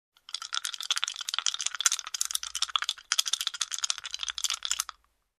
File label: Dice Roll